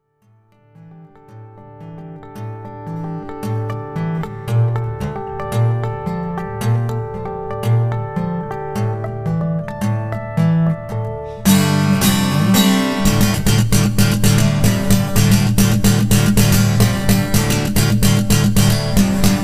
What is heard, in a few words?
funky sound sample